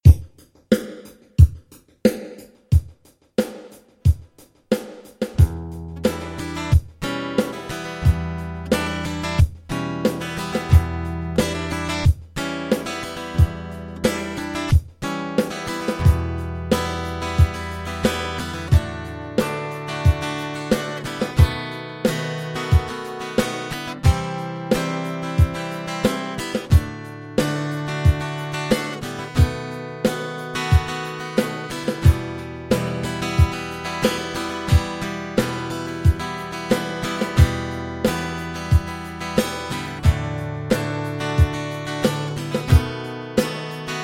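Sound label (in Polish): wstęp zwrotka refren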